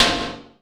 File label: DISTRACT, EFFECTED DRUM; Crypton - DrumBase5000